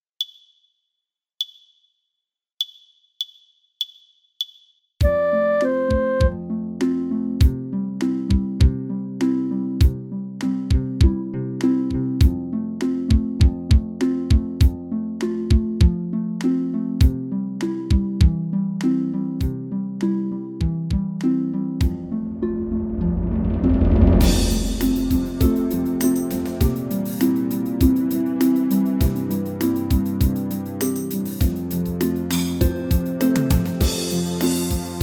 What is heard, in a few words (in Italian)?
base senza melodia